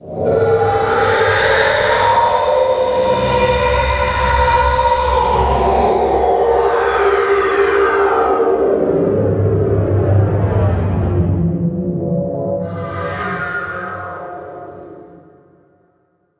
VFX Atmos

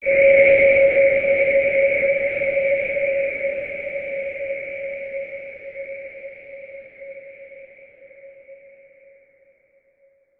Tonal FX C#

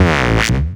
Synth Bass; Vengeance Samples; VEE Synth Shots

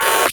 Minimal Perc; Minimal Percussion

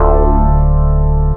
Synth Shots; Vengeance Samples

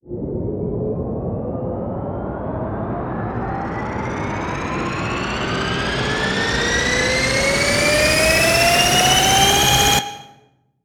FX Uplifter

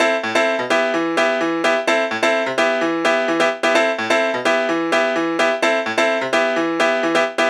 128BPM Melody; Vengeance Samples; Doubleride Kit

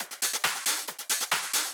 137BPM Loop; Drums & Perc; Vengeance Samples; Universe Kit; D minor

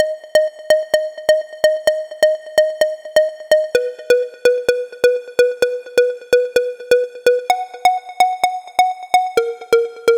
128BPM Melody Root D#; Zeta; Vengeance Samples; Zeta Kit

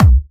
Bassdrums UK Trance Kicks; Vengeance Samples

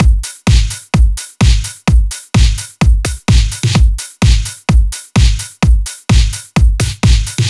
Housebeats 128BPM; Vengeance Samples

